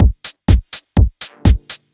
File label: future drum loop full - a sharp; drum loops